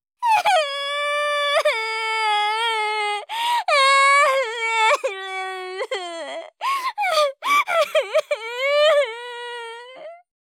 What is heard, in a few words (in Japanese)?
泣き; ASMR; 相づち・反応系【ネガティブ】